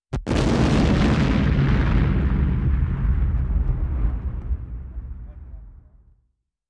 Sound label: Huge Bomb Detonation